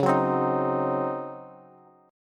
Db Major 7th Suspended 2nd Suspended 4th, Db, Bass; DbM7sus2sus4 strummed; JGuitar